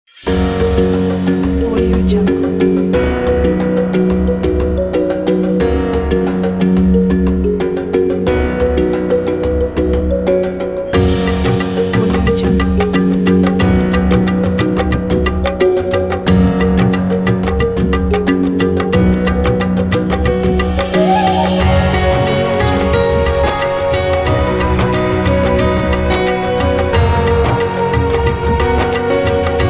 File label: inspirational african pack